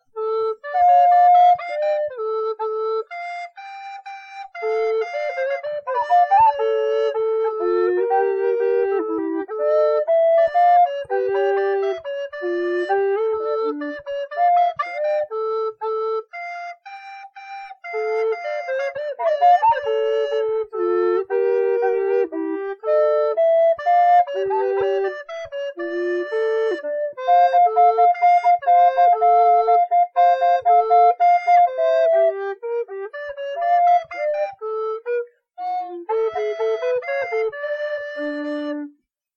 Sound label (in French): menuet mineur; lully jean baptiste